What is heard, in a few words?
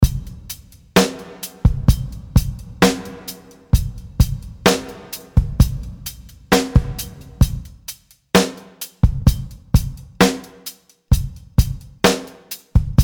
MORE RANDOM HALLS